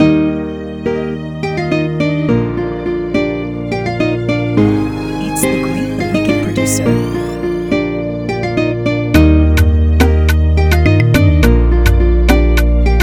beat; dgreatbeatz

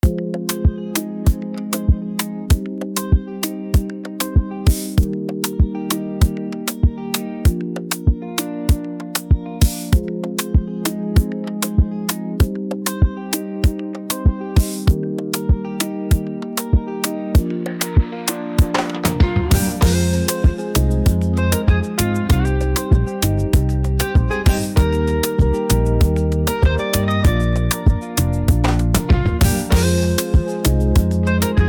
BEAT

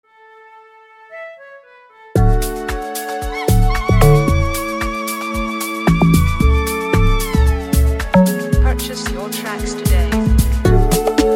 113bpm Amapiano

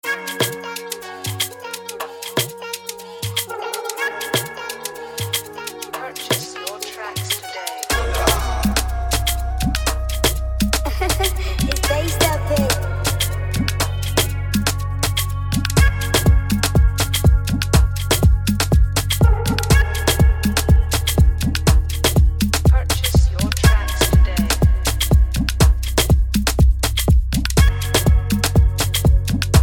Jamming, freebeat

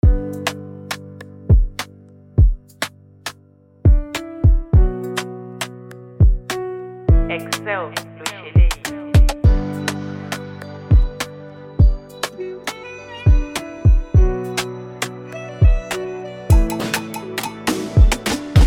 BEAT

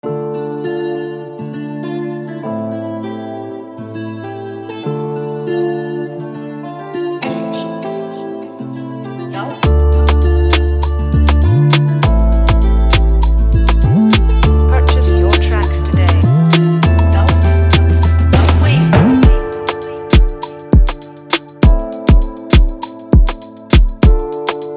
FREEBEAT

